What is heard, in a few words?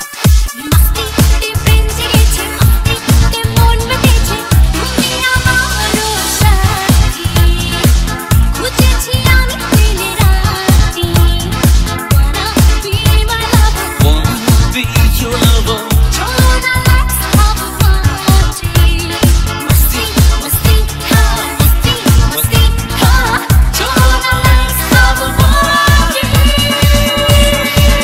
Super Hit Bengali Masti Song Ringtone; ringtones